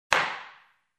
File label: Judge gavel single time